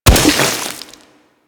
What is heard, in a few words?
Chem Strike Nox Rifle Projectile Explode